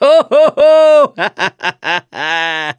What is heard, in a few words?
laughter, mechanic, survivor